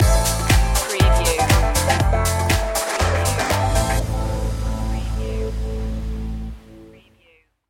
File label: disco chic stinger